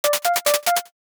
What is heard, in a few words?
cute panic steps